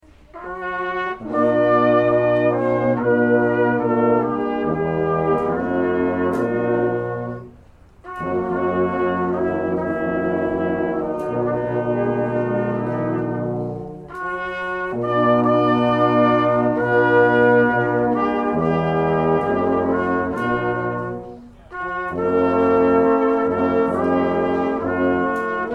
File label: Tenting tonight taps